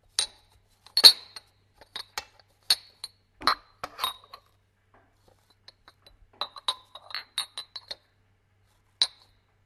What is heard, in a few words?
Tea cups